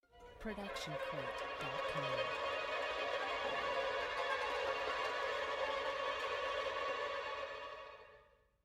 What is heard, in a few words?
trem and random pizz